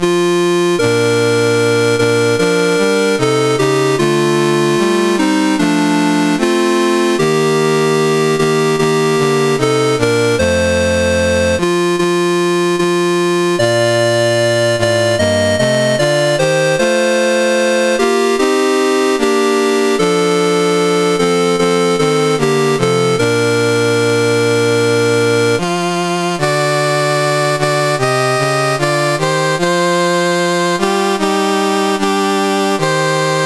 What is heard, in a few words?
North Korea, National Anthems; CSG8580R5; Dave Marquis